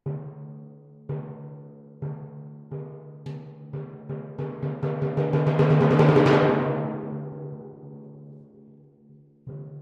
Cómo suenan los timbales

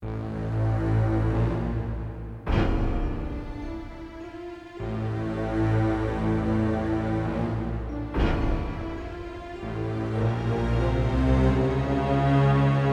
Sonido de suspenso

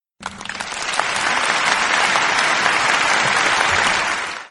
aplausos

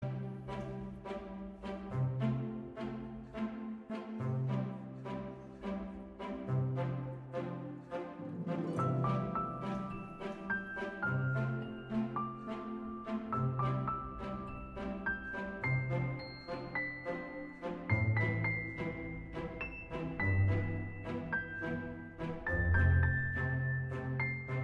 Música para Estudiar; Ciencias Naturales

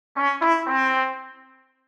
trompeta

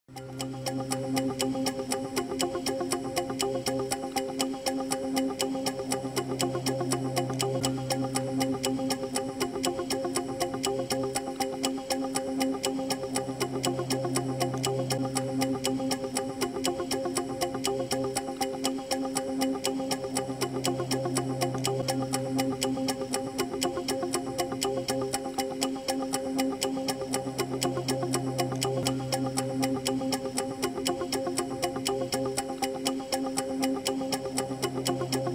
Musica; Musica Del Rosco Pasapalabra Chile Oficial

